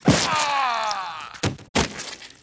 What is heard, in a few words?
bloody kill single shot; fallout